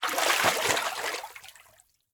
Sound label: Liquid Water Water Foot Splash